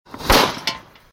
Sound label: explosion; UltimateShooter